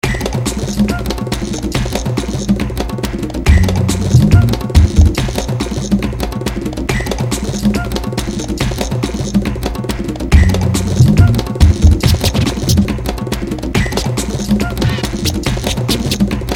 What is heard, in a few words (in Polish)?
Walka Z Bossem; Indiana Jack